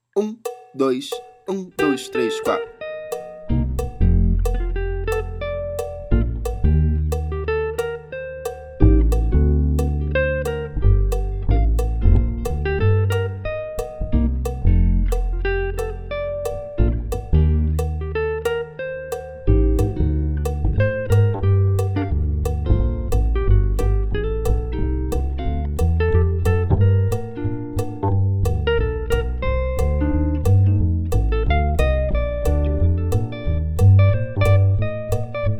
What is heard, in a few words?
playback, bateria